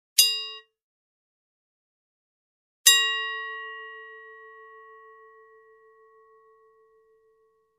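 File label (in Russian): звон стали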